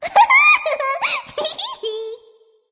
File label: yay; smite; voicepack; ratatoskr